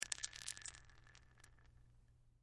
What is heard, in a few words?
Bullet Shell; Bullet Shell 223 Drop Cement; Drops (Sound Effects:Objects); Blastwave; Warfare